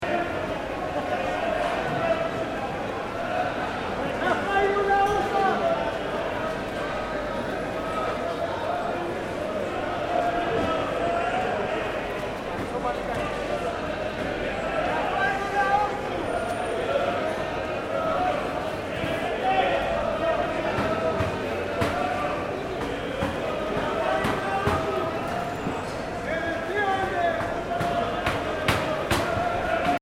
Fish Market Crowd Short, Dense crowd of people shouting and chatting; in market square. Can also be general irate crowd, excited cr; City Sound Effects